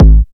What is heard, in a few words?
perfect steel kick drum sound g sharp key